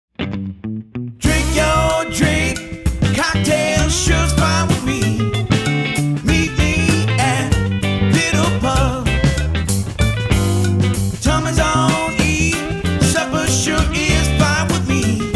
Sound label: jingle; Little Pub